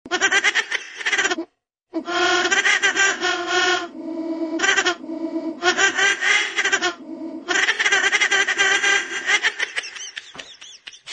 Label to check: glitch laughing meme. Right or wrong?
Right